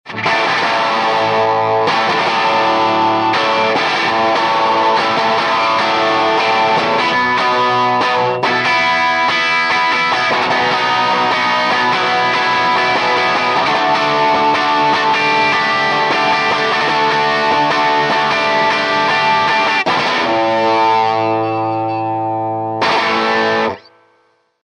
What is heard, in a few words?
Les Paul